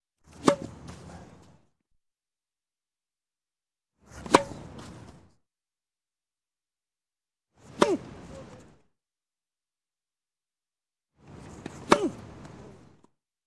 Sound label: Tennis Serve; Sound Ideas 2000 Series